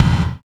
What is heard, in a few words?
CYBER KIT, DRUMS